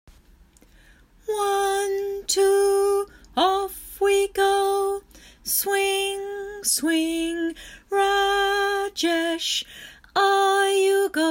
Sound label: Swing time